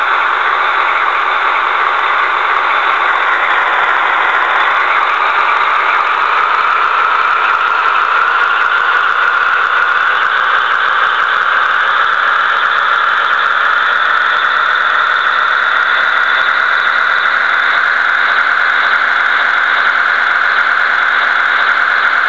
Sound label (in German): fahren